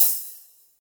subtle reverb open hat g key, Royality free open hi hat tuned to the G note